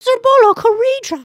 sway, emotes

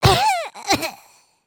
Die; Lego Strombie; creatures organic

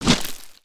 Large Pet Digs; creatures pets

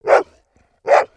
doberman bark; creatures pets

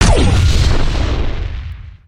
Rocket Impact

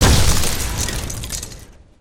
earth smash; destructibles

